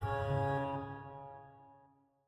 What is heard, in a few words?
instrument note